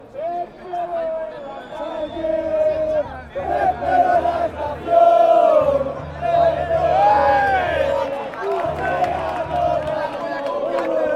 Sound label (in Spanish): leganes canto